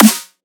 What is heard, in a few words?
Dubstep Snare; Ghosthack; Dubstep Sound Arsenal